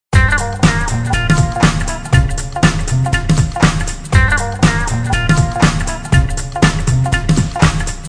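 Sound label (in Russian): легкая веселая мелодия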